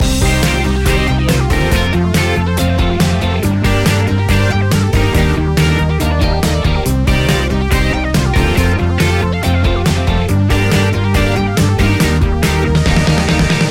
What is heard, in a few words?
full city loop